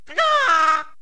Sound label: Red Bird Yell; Angry Birds